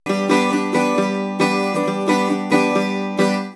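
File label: bouzouki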